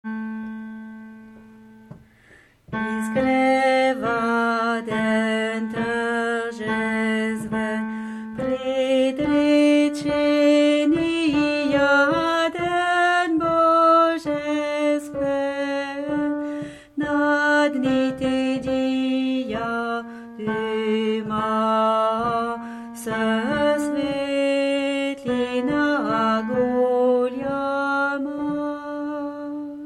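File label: alto, chants; SATB; Izgreva den tarjestven; Peter Deunov; concert du 15 août 2009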